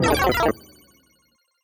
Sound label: player ko